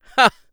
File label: laugh; Eternal Return; ENG